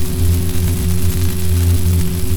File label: Scifi Dome Shield Loop; SFX Kit; Sci-Fi Loops